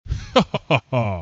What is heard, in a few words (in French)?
Oh oh ah, Rires